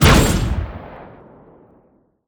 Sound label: fire, weapons; plasma repeater